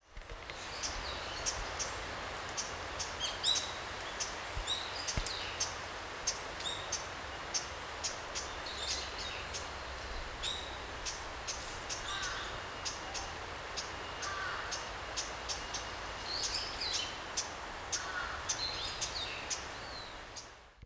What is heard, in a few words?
uguisu, bird song; ウグイス; 新潟県新潟市秋葉区 菩提寺山